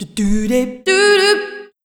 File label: Vocal De Du Dip Du Dup